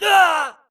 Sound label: grunt death quick; Titanfall 2